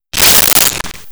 Sword Stab Through Flesh; Weapons & Explosions

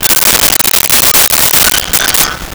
Pickup Dishes Tablecloth; Vintage Cartoon; Foley

